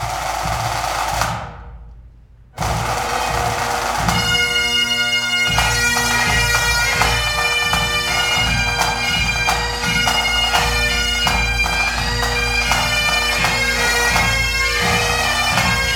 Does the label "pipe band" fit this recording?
Yes